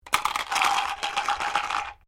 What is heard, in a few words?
Dice medium shake plastic cup; Variety of dice sounds; Casinos